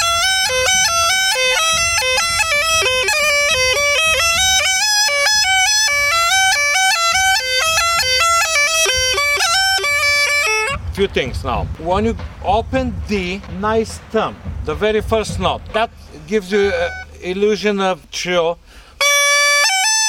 Chetvorno Line Ornamentation, Small Gajda

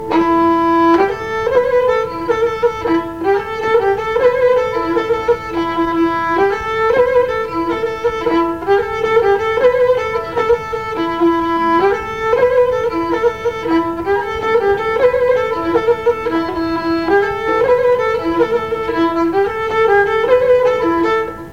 Pandalash line#3, Gadulka; Lesson Recordings; Nikolay Kolev - Gadulka Lessons

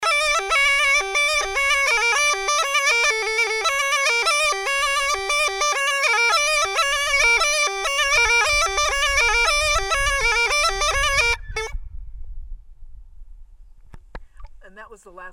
kurma fragment, Gajda; Lesson Recordings; Petar Usmivkata Aleksiev

